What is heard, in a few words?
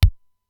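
Simmons SDS-9 bass drum, Drum Machines; Factory D4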